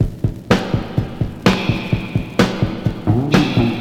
126 bpm breakbeat sample g sharp key, Free drum beat - kick tuned to the G# note